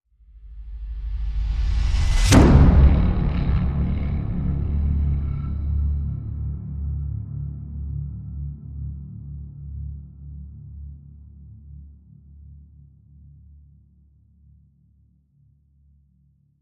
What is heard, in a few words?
Hit Sonic Rip